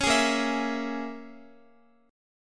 A sharp Minor 7th Flat 5th A sharp, Guitar 4E,4A,5D,5G,5C,6F; A#m7b5 strummed; x,x,11,13,10,11